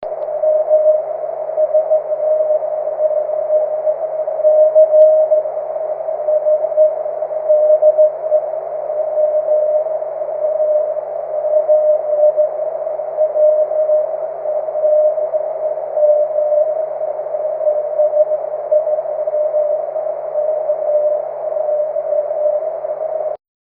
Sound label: cw; 23cm